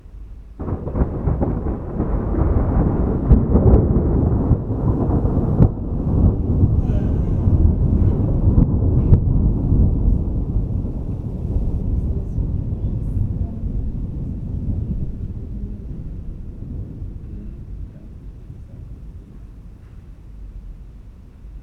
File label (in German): Gewitter; Fieldrecordings; ca 3 Uhr morgens